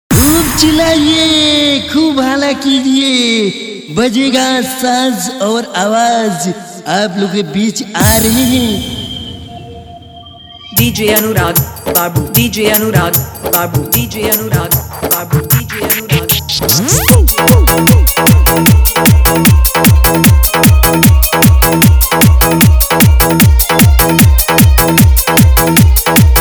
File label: Dance Mix